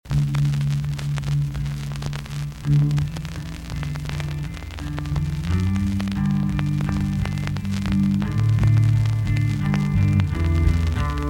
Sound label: unprocessed, vinyl; freeware vinyl to cd de-clicker